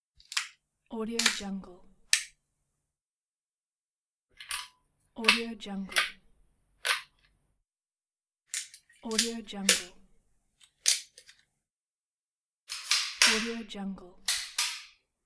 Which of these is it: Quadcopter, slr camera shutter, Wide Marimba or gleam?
slr camera shutter